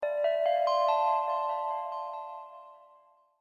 Notification

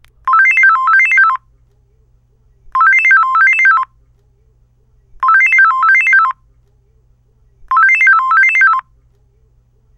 Lg Analog Ring

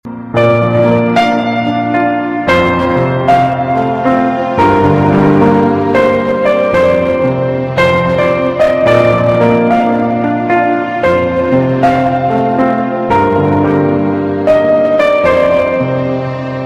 Music

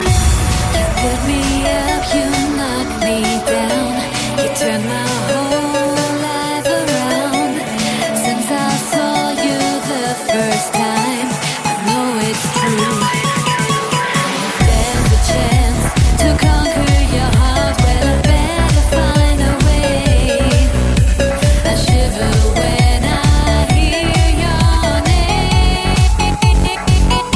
Dancemusic

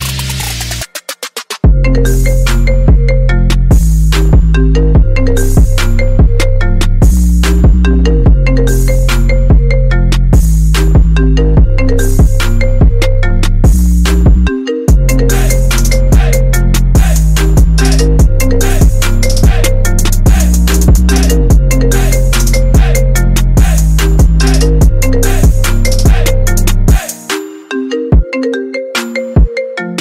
Iphone Remix TRAP

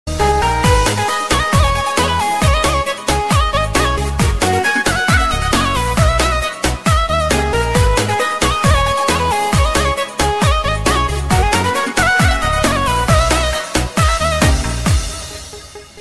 Arabic Folk

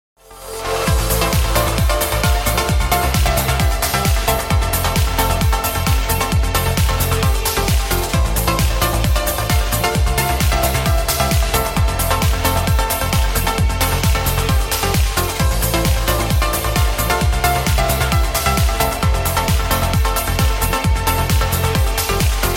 Trance

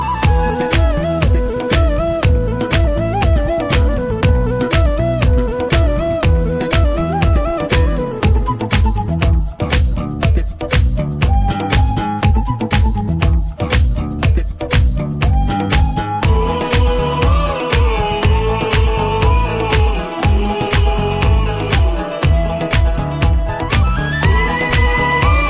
Rocking

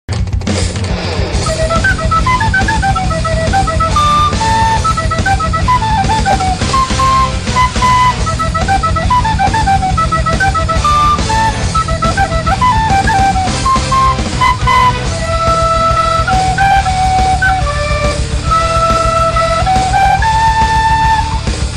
flute fail

